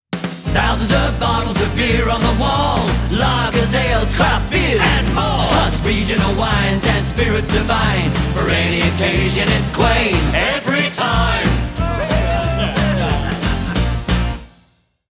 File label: full sung; Quains Liquor